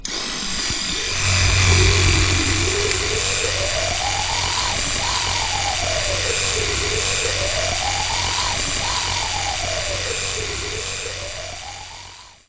sähköhammasharja

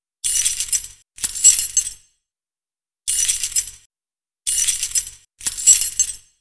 avaimet

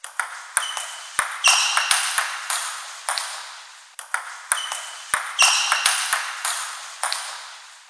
pingis

